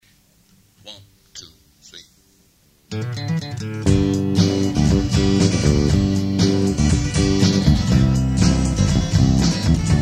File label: karaoke